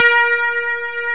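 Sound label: guitar, clean electric guitar; Roland L-CD701; Clean E.Gtr5; Death Strat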